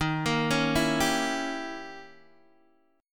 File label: D sharp 7th Flat 9th Guitar Open D